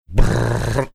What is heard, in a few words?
making rapid fire sound